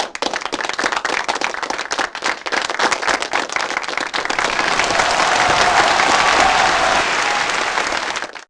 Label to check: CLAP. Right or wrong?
Right